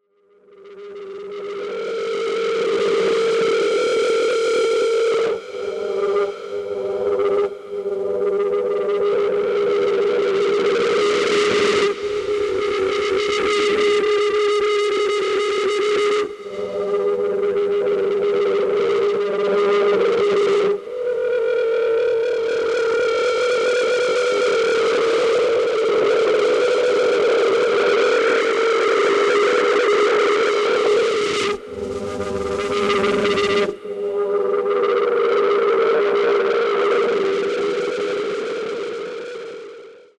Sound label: Interference Field Transmission